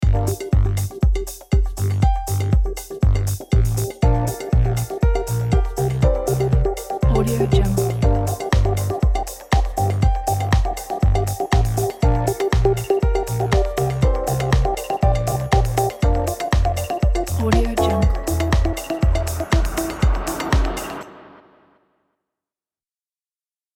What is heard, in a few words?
trendy intro ident